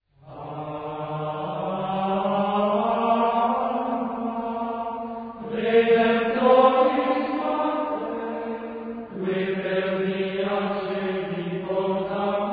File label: Alma Redemptoris Mater Simple Tone